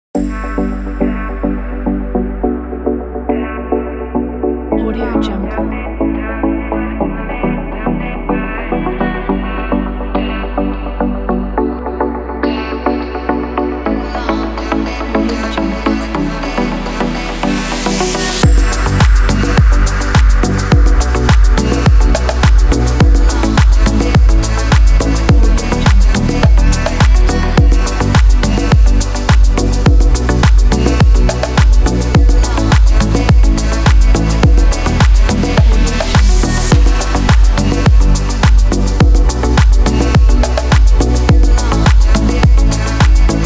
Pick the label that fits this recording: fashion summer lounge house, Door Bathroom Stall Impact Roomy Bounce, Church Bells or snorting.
fashion summer lounge house